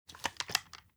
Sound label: movement smg; gmod; arccw uc commons attachments